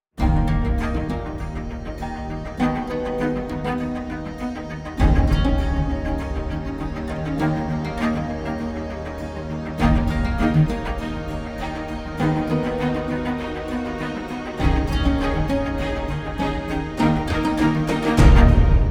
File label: Sting Desert Noon